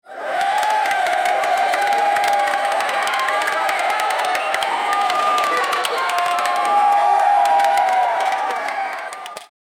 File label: long loud game show hallo